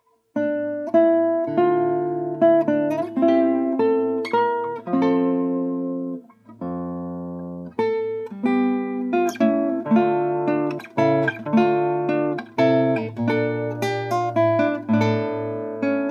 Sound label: guitar